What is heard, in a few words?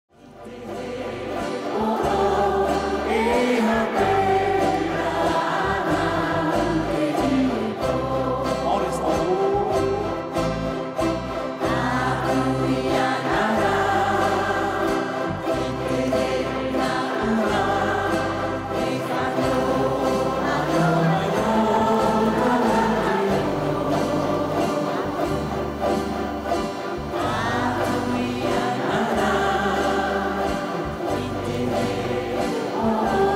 Ukulele, Ukulélé forme ancienne - Polynésie française